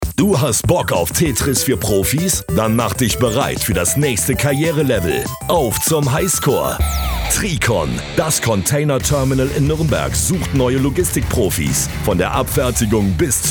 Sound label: Retro Computer Game; STARFM; TRICON; Mitarbeitersuche